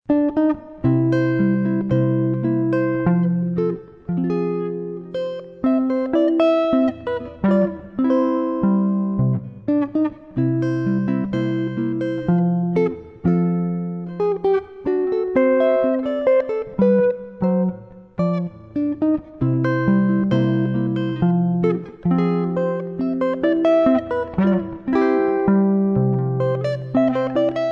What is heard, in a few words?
The Entertainer solo guitar